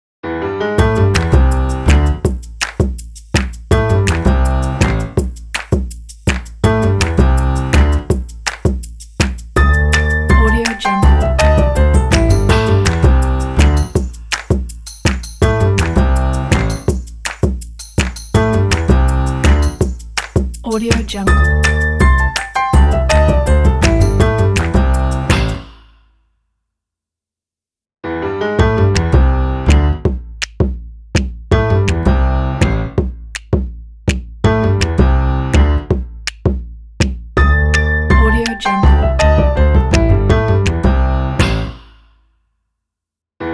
piano bass logo; redoctopus

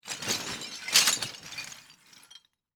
rock tiles shaking; matter mayhem; soundmorph

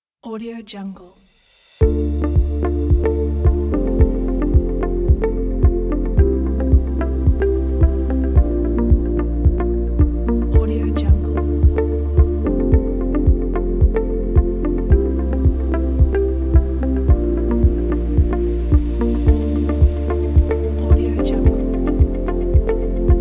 the minimal ambient

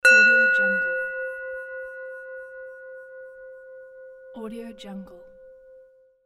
bell

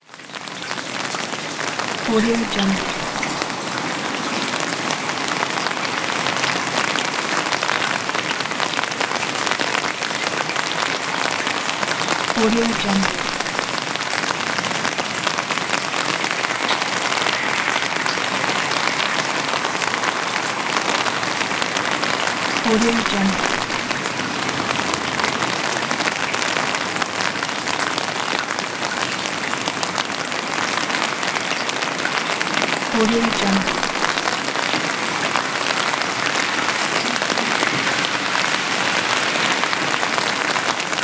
under the umbrella; lucamanzella

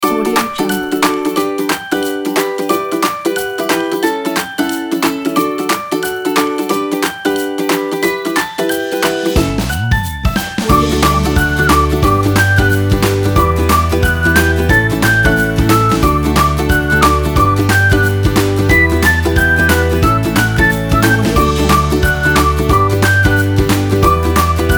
happy music

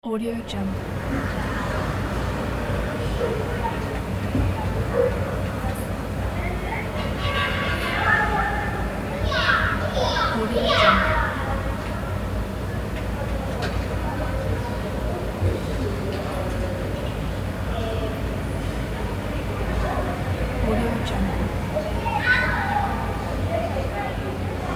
neighborhood with children

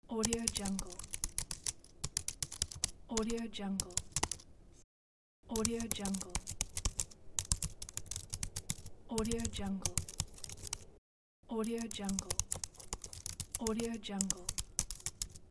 computer keyboard typing; pashastriker

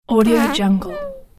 fart

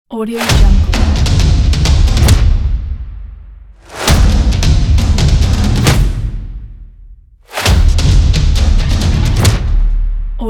tribal

